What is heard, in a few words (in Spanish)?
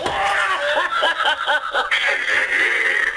risas